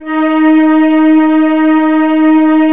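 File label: M1 STRING D#1; Northstar - New Gold VOL-1; M1+D50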